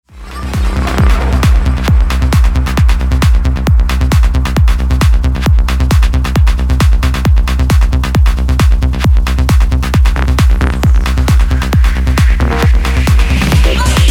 atmos wavetable

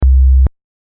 kick clicks; massive

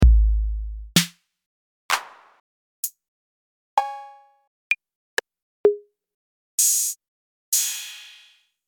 tr 808 kit

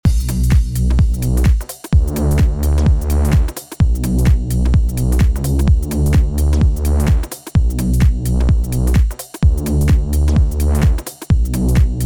modulation example

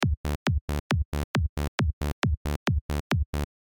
bassline synthesis

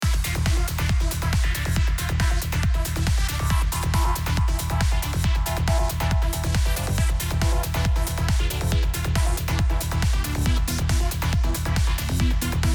Rough Melody

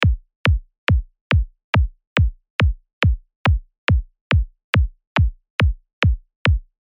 Kick Overlapping

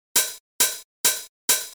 hihats lowend

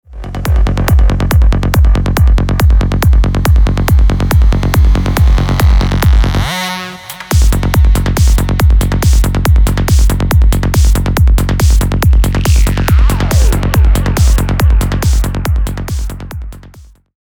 making a snare

